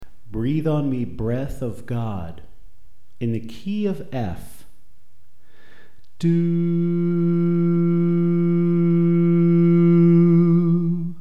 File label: Bass; Breathe On Me Breath Of God